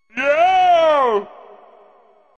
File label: toad joy